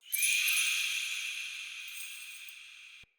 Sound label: Day Texture, Ambience